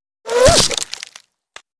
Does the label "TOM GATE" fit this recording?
No